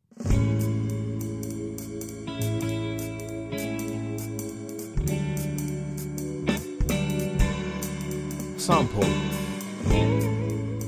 Spring Jazz

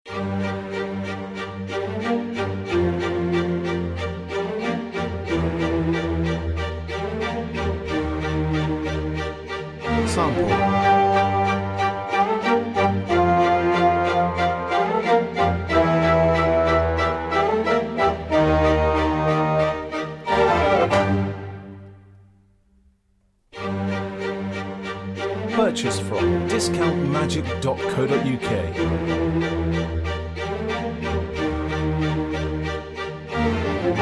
classical

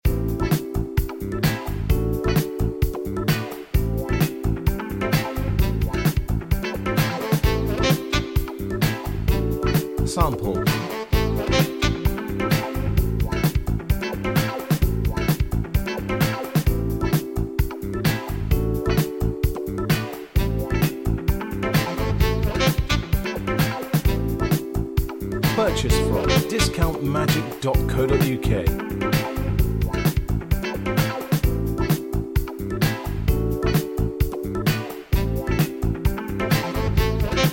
light relaxed

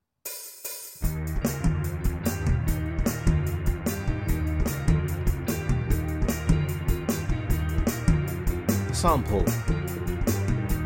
Jungle Surf

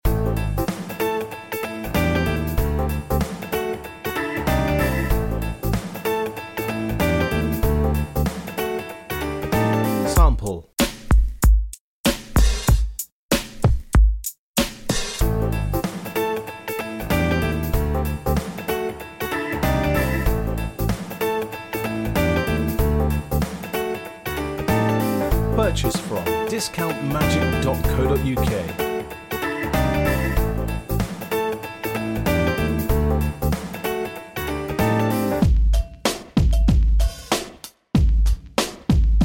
relaxed upbeat

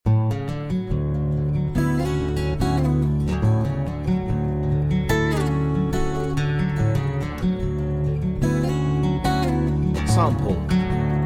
beat

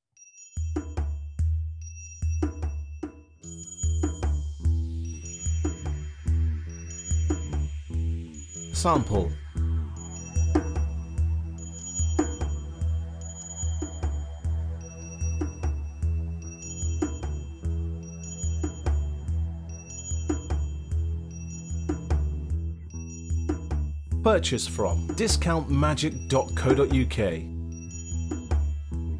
Tribal

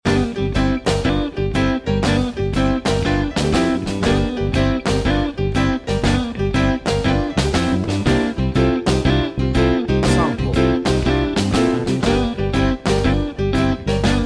piano upbeat

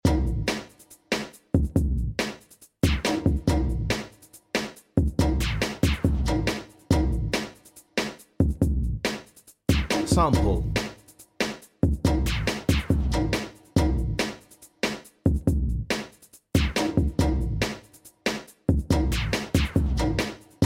beat

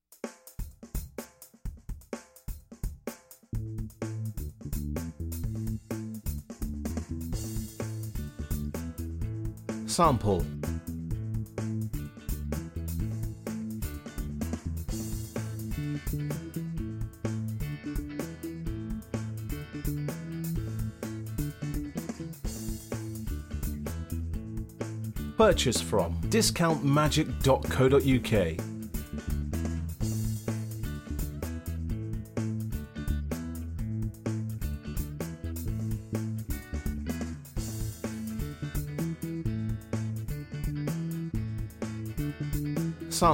Upbeat Groove

